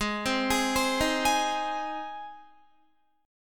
Ab Major Ab down Guitar; arpeggiated; JGuitar

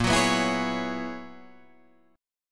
Gb 11th Bb

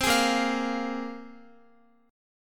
A sharp 7th Suspended 2nd A sharp, Guitar; strummed; chordshape; x,x,12,13,10,13

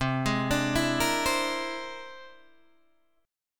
C Augmented 9th, Guitar, Open D

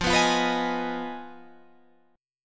F Minor 7th Flat 5th F

